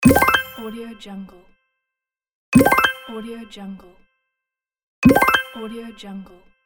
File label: simple win